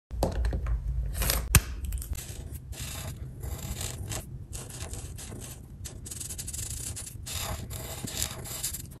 marker on paper

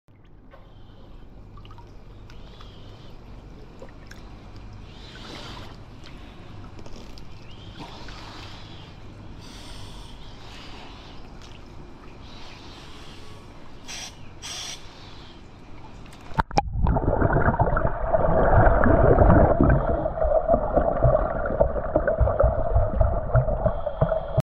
Diving mask with capsule; ماسک غواصی با کپسول

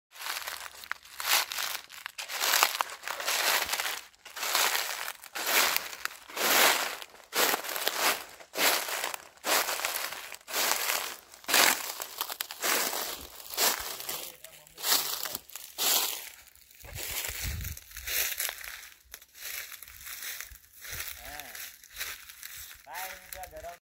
Walking on dry leaves

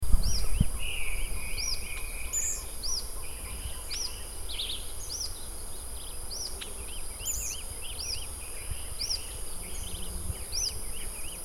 Rough legged tyrannulet

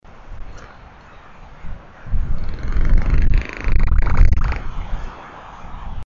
Albatrosses; پرنده آلباتروس

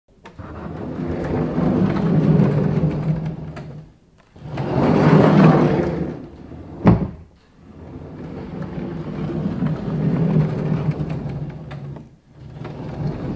Sliding door

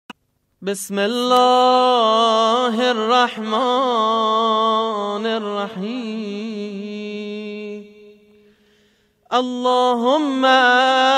Dua Allohomarfani; دعای اللهم اعرفنی

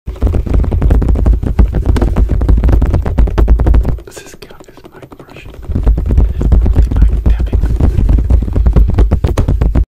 microphone asmr; ضربه زدن به میکروفون; اسمر

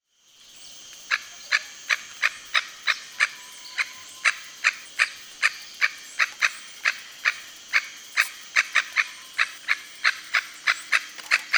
Red necked aracari

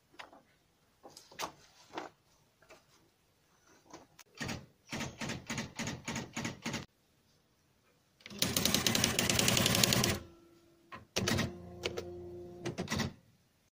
Typewriter

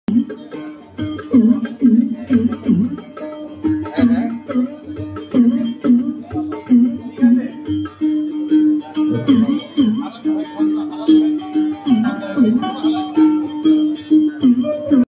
Ektara

